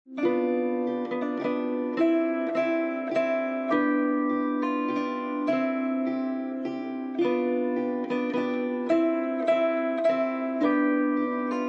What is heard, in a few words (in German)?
Suszer die Glocken